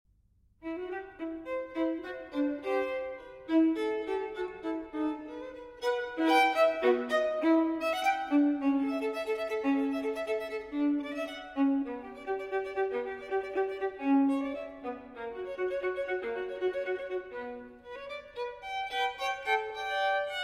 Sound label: Solo Violin in F Minor Presto; TWV 4016; Telemann Fantasia